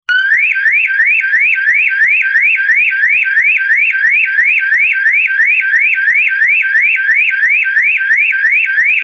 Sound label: Car alarm